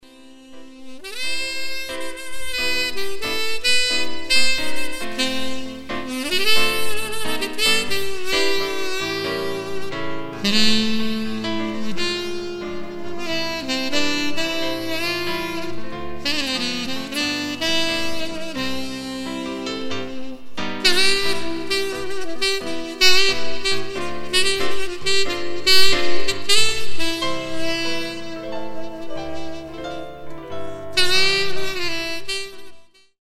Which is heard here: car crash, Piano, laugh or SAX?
SAX